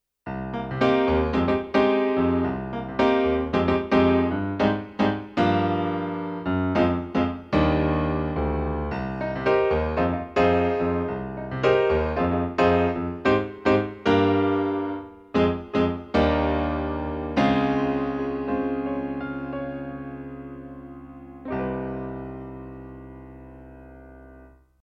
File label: Grand Piano